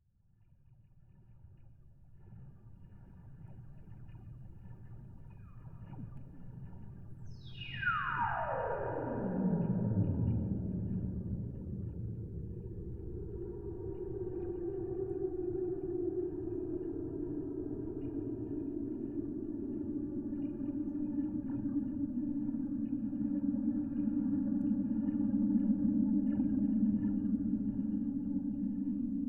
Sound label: chirp wind, slinky